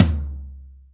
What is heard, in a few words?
2000s Long Tom Sound C# Key, Royality free tom drum sound tuned to the C# note